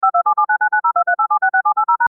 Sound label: Dial-up Internet